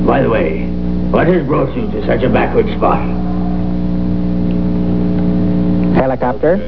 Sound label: helicopter